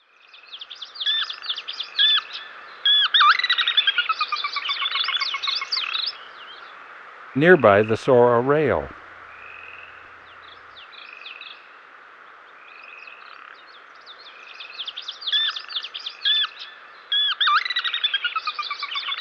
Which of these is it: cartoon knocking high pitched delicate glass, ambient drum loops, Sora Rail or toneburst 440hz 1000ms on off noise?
Sora Rail